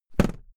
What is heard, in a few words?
briefcase drop close; industrie wirtschaft; bueroarbeit